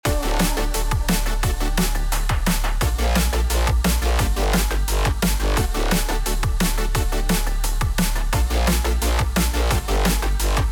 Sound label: chords example